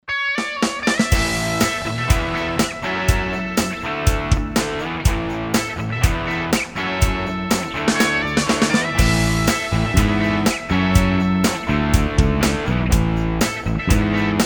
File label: music sample rock